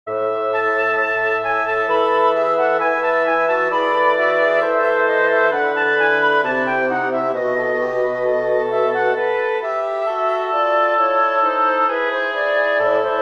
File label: for woodwind quartet; bach johann sebastian; prelude christus der uns selig macht